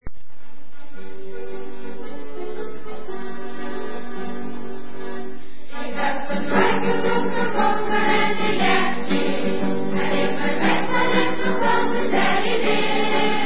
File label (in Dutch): liedje koor